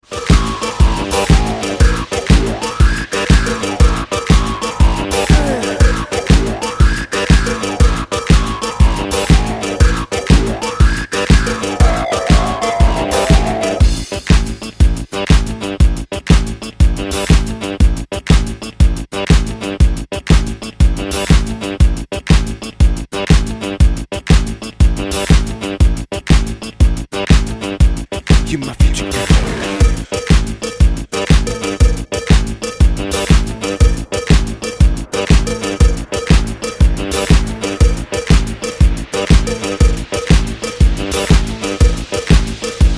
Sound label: Dub